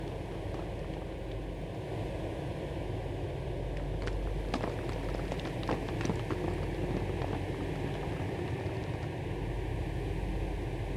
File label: Ambient - Labour Camp